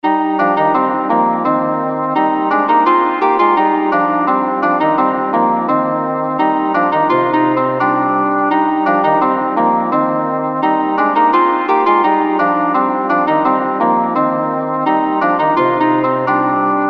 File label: Hanter dro; à Strakal